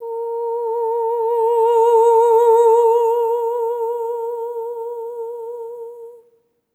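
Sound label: Soprano Oh A#4, Voices; Spectrasonics; Symphony of Voices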